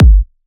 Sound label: Kick, 126BPM; Netherworld Kit; Vengeance Samples